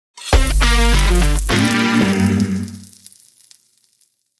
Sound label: logo; BUTCHER LOVE